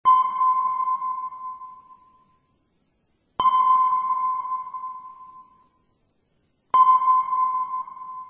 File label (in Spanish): Sonar Submarino